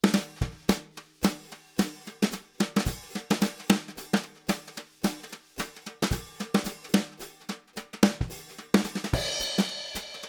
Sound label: Bebop Jazz Drum; MusicDelta; MDBDrumsPlusPlus